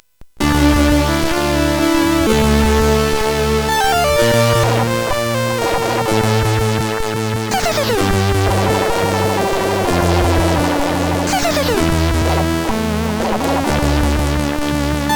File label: Outer Space, CSG8580R5; hvsc